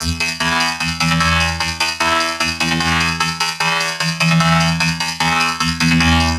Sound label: Roboatic Strangeness 150BPM